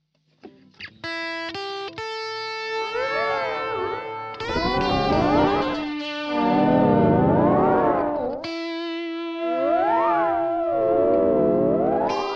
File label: Swirl B guit